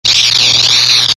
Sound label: saber block